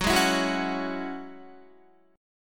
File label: Gb 7th Suspended 2nd Gb Guitar; Gb7sus2; strummed